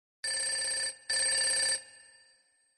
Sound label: ring ring ring